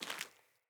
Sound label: Sandals Walk Rock, footsteps; Player